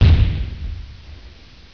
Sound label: CANON; homm2